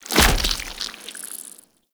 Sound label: Land Heavy Goo, Footsteps; Warframe; Tenno